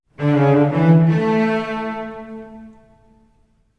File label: shell mnemonic celli